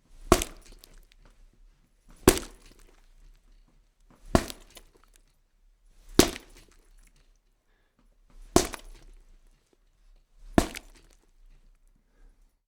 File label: meat punch